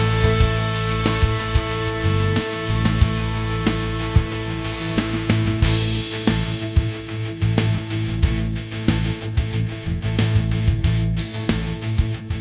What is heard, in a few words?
energetic rock; ai